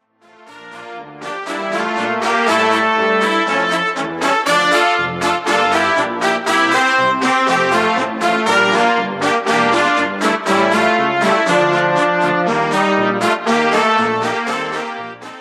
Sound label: brass